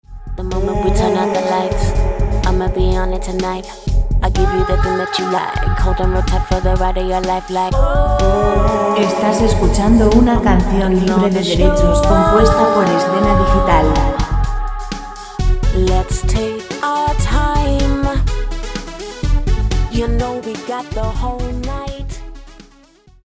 125 vocal etnic musica; vocal musica libre

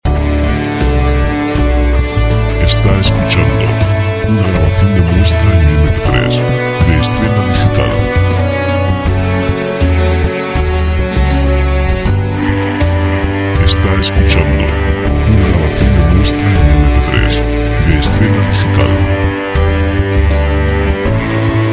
balada rock; Música de rock; sin copyright para documentales

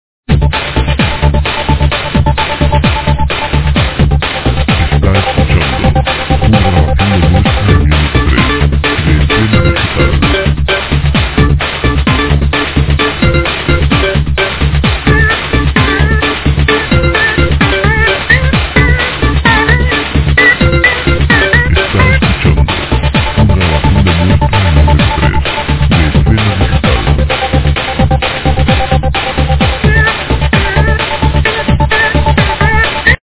informativ; sintonias

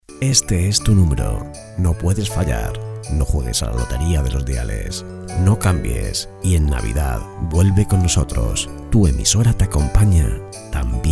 jingle navidad loteria; Voz imitando a Papá Noel; Locutor imitador y actor de dolaje; Mensaje de navidad número 2.

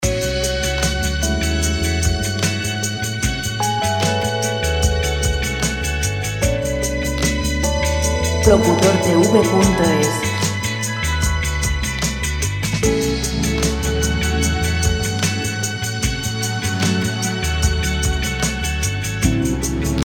75 lent; relajante

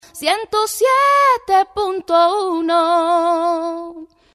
jingle dial 1071, Dial 107.1 Cantado

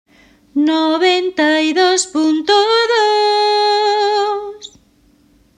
cantante dial jingle singer, grabación del dial 92 punto 2

